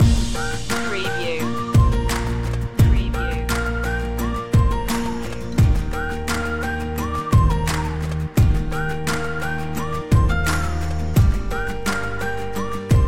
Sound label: puddle jumper loop